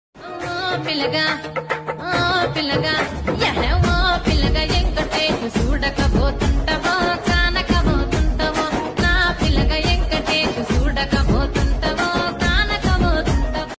telugu folk song

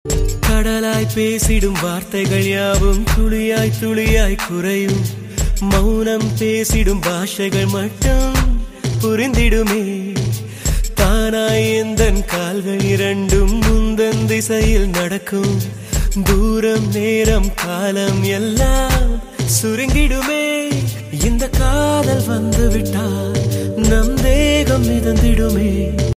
love status hit songs music

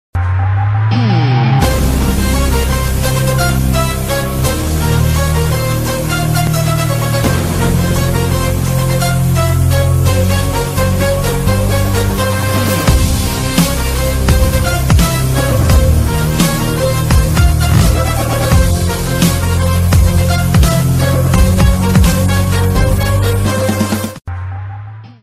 mass bgm ringtone; chakravyuha

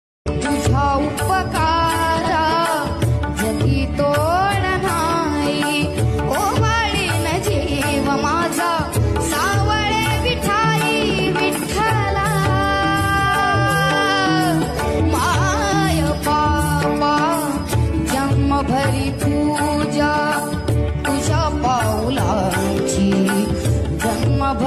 marathi ringtone

